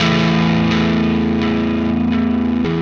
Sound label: Static FX Guitar; Sonic Foundry (Sony Creative Software); Indie Rock Anthems; Session 13 - Static